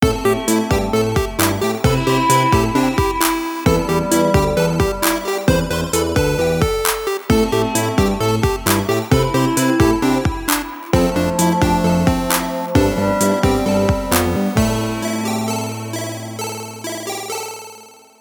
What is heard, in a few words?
Ringtone